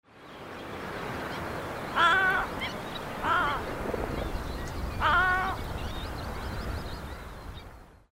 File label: little egret